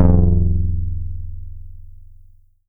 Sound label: BASS SH09 F0, Synths, Roland SH-09, Bass